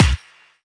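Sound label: snare drum bass drum, Dance Mega Drums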